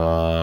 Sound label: bass; 音MAD; 命运石之门